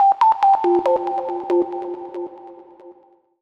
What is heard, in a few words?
TECHNO SYNTH 140; Keys